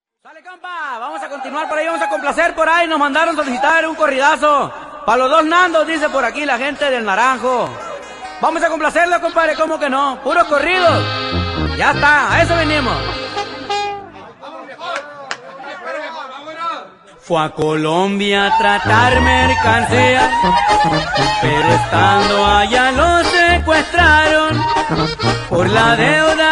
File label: ringtone